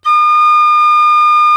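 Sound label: penny whistle, World Winds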